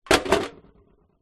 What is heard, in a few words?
foley tin small down on ground; zapsplat